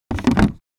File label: Bathroom trash bin lid close